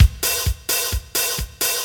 44 kick

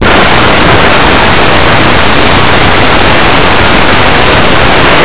enlarge

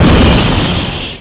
beam

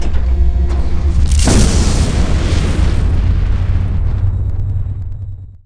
big beam fire; stars

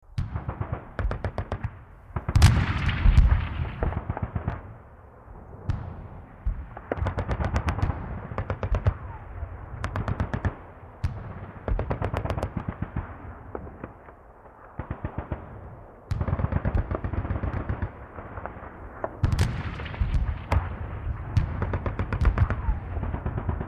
Battle Background; 1944 Battle Of The Bulge

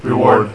reward; Imperialism Demo

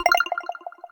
chain

